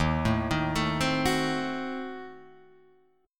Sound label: D sharp Diminished 7th, Guitar, Half Step Down